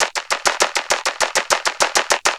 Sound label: Vocobeat; Sonic Foundry (Sony Creative Software)